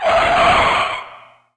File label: Car Braking Hard